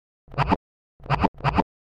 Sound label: HOUSE FX 125